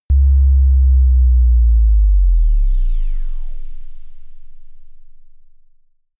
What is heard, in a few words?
sizzle sine drop